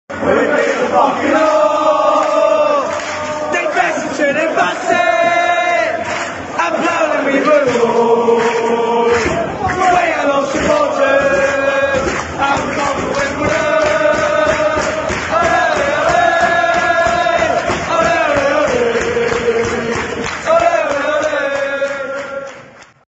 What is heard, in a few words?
allez allez allez chant; afc wimbeldon